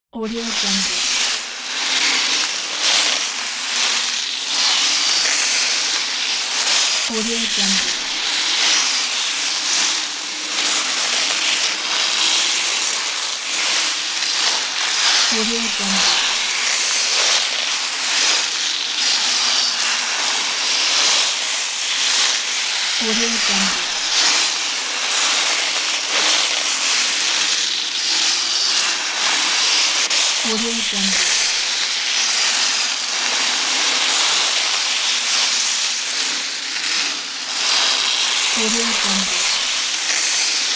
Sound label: skiing